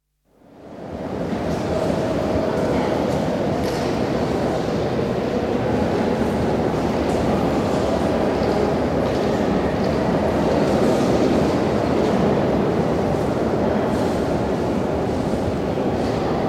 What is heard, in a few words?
Crowd atmosphere at Louvre Museum, footsteps and voices in the Grande Gallery; Crowd Sound Effects; BBC